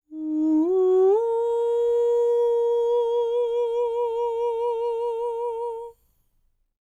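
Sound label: falsetto, Vocal Planet Jazz FX; Spectrasonics; SA FALSETO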